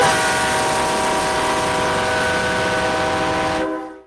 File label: train sound